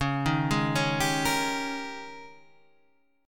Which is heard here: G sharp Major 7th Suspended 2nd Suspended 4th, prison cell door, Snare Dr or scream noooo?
G sharp Major 7th Suspended 2nd Suspended 4th